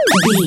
retro collect pickup item

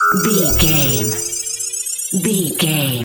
sci-fi power up

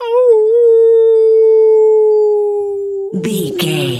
wolf howl long

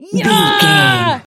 voice female a battle shout

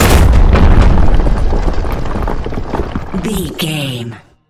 rock earthquake impact

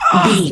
voice male hurt pain

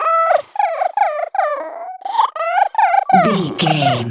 goblin fairy cry

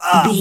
voice male grunt pain

